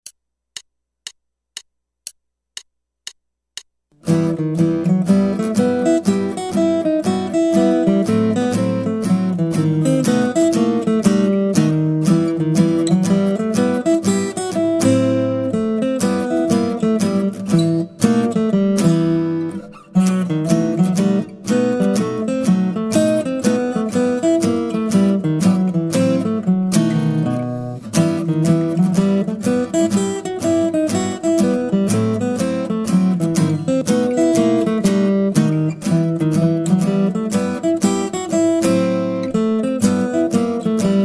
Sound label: Guitar